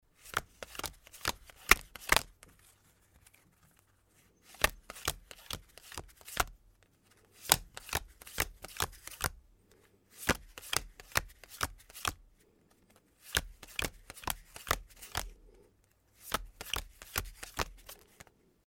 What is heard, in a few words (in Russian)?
Razdacha kart